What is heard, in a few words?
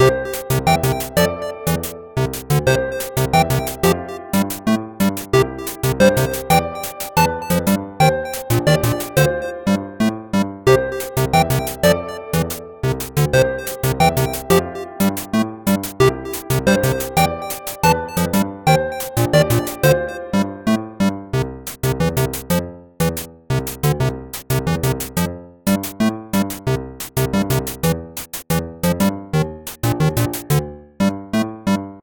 menu; Undertale